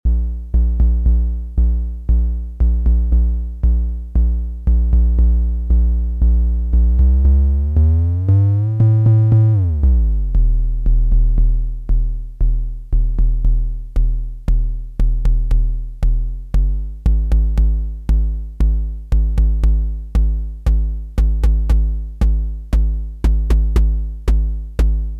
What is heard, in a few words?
Bass Drum